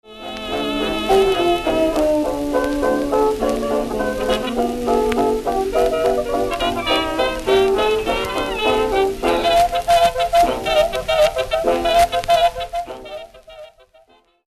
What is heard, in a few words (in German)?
Hot Jazz Beispiel